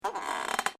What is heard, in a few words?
METAL CREAK; Materials